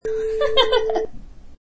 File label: Young Lady Laugh